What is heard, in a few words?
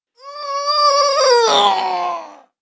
Pushing - single, Adult Female